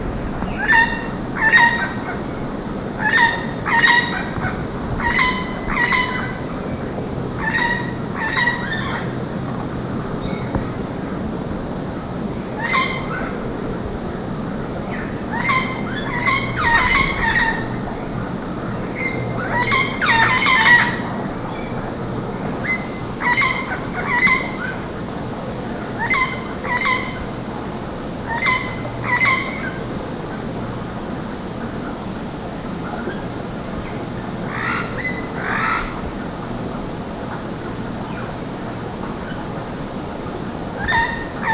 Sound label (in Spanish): cotorra